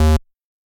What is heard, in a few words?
bass